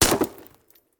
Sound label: Bullet Ricochet; Sound Ideas; Guns